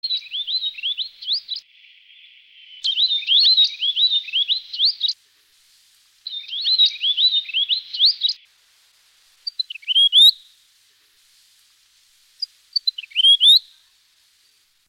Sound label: weebil; australasian warblers; weebill; canberra garden birds